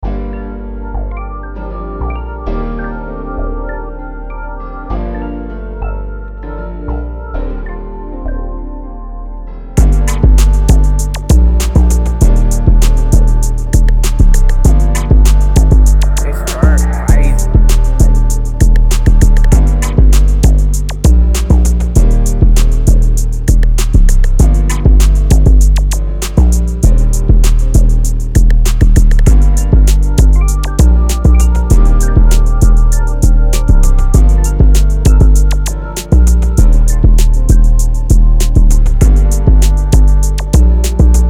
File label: gminor 197 detroit type beat